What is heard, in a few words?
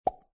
plop